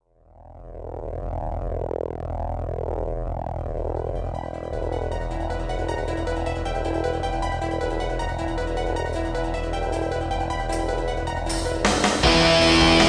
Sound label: Boss Battle; Pizza vs. Skeletons; Bare Boned Boss Battle